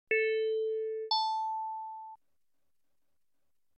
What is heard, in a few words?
Default Two Tone, alarm sounds; Standard-Zweiklang; Milestone 312; Weckmelodien